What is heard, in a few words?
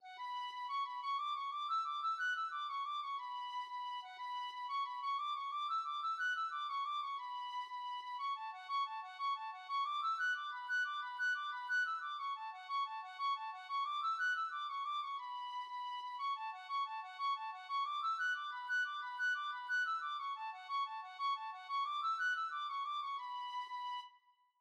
tin whistle